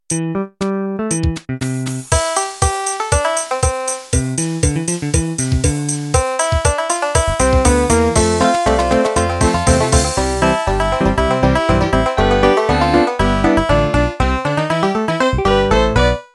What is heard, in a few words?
Bluegrass intro